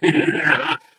ballbot impressive

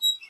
squeak; Source Engine; cstrike

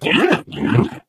ballbot agreement

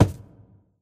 phys cube, physics

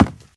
wood, footsteps, infected, walk; player; source engine; left 4 dead 2

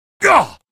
pain, male; video games; npc; source engine; episodic; episode 1

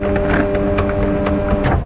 combine terminal idle, machines; ambient; Source Engine; video game

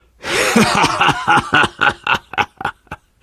laughter, biker; source engine; left 4 dead 2

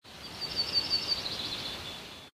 forest bird; ambient; Source Engine; tf

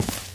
walk tile, footsteps

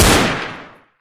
pistol fire; Left 4 Dead; Source Engine; gunfire

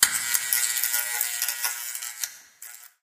light flicker end; Source Engine; Portal 2

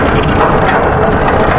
rail move; Source Engine; bshift

